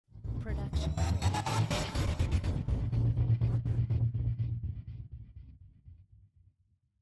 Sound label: Rhythmic Rise; soundscrate